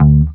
Stingray pluck D2, bass

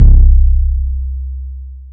HOUSE KICK, Deep Hse Kicks; CF DeepHse Kicks

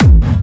BD Clubby, kicks; VEC1 Clubby

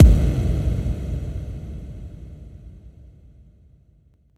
BD Reverb FX, Verb Kicks; VEC1

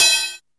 Boss DR-220E Cymbal

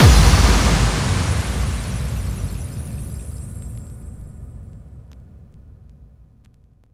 BD Reverb FX, Verb Kicks; VEC1

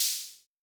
HH Op, Acetone Rhythm-Master

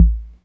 PERC, Acetone Rhythm-Ace

